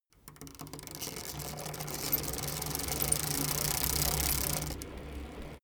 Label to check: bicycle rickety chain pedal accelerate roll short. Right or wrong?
Right